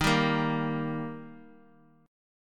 D sharp Major D sharp, chord

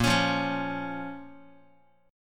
A sharp Minor A sharp down Guitar; strummed; JGuitar

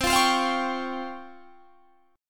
C sharp Major 7th Flat 5th, chord

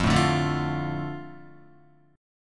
F 6th, Guitar; strummed; chordshape; 0,0,2,2,2,1

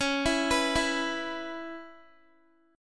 C sharp Minor 7th C sharp Guitar; C#m7 arpeggiated

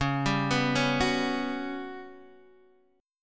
G Major 7th Flat 5th

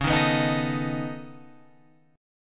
Db 7th Suspended 2nd Sharp 5th, Guitar, Open F; strummed; 13,10,11,10,12,10; JGuitar

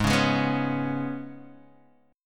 G sharp Minor Double Flat 5th, Guitar Open D; 6,4,6,5,4,x

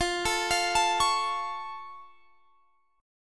F Augmented F down Guitar Standard; arpeggiated

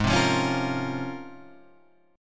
C sharp Major 13th, chord

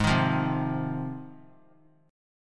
G sharp 6th G sharp down, chord; strummed; JGuitar

